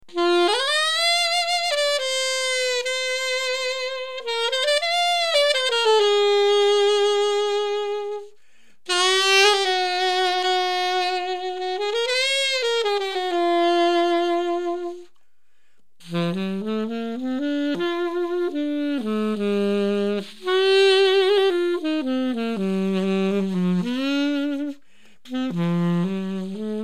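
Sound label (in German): steamer diverse ansaetze, Saxophon; rigotti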